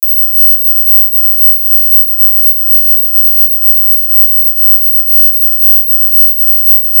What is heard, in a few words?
the buzzer, mosquito ringtones